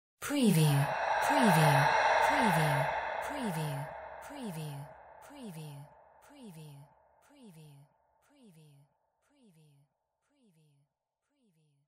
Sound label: sci fi whispers spooky; windy beach audio